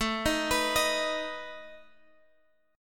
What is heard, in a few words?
A Suspended 2nd Flat 5th A down Guitar Drop D